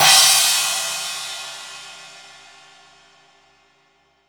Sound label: sfx perc